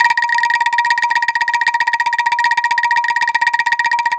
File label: ANGKLUNG A3; Spectrasonics - Heart of Asia CD2